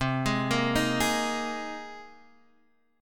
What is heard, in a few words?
C Minor 7th Sharp 5th, Guitar, DADGAD; down; chord shape